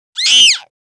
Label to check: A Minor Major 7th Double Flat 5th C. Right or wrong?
Wrong